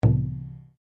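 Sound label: Drum Taiko piano, Japanese Taiko Drum; Miscellaneous Musical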